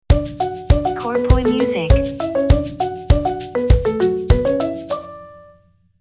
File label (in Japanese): jingle ウォーター